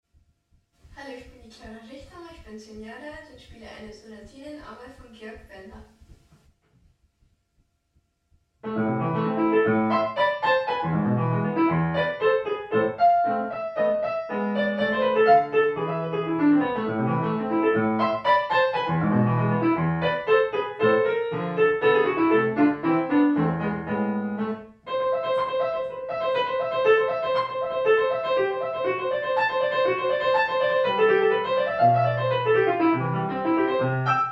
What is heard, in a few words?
Sonatine A Moll; Benda